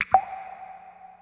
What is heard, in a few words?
drip; super trench attack 2